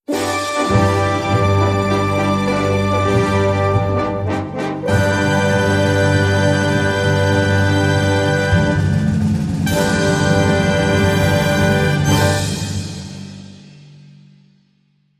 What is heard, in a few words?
Cartoon